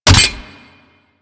Grapple Hit

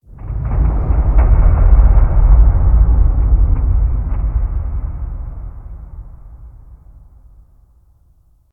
Cave Underwater Rattle; Ambience; Warframe; Stalker Cave

